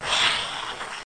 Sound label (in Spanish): Brújula